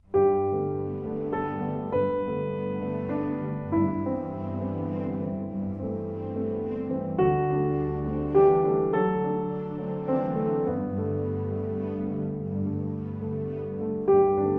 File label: Lullaby With Strings